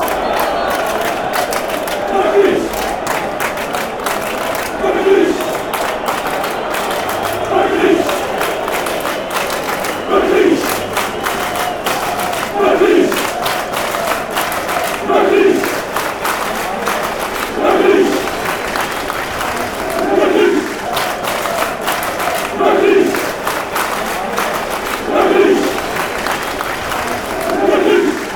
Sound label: baggies claps